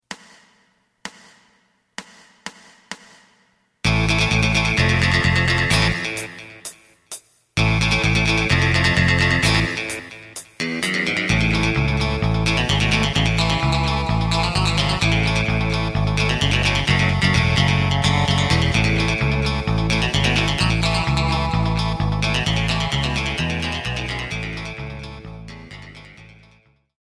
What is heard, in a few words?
drum